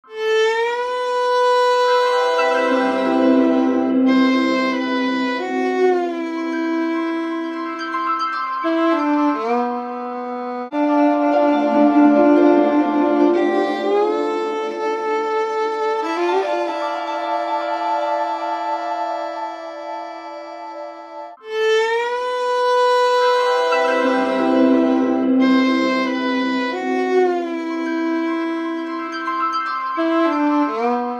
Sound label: Asian, ringtones; Yezz; Max-1